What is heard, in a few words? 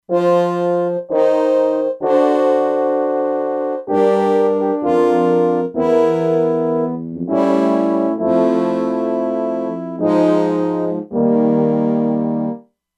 Section Horns, E-mu Proteus-2; Factory Presets